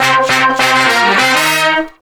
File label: Horn Sect Effect; Effected; Sonic Foundry (Sony Creative Software); Jazz Solos and Sections